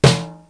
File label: drum, EMU Proteus; Proteus1